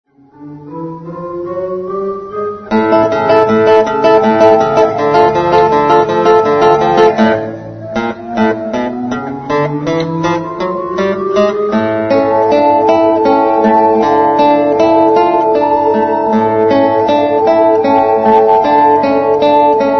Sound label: orgel git